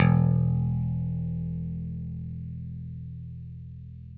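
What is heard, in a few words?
6 string bass; Sampleheads; Whole Lotta Country 1 & 2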